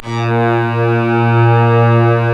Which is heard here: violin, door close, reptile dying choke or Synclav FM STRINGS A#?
Synclav FM STRINGS A#